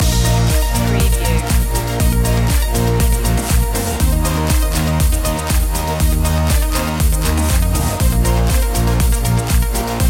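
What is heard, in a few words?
Electronica Electropop underscore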